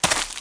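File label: footsteps foliage; Spearhead Demo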